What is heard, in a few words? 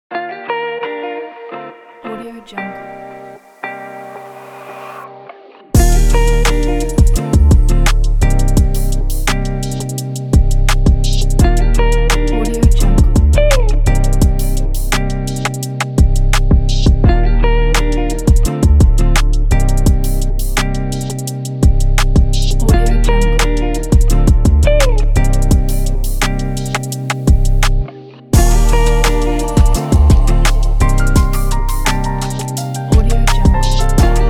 hip hop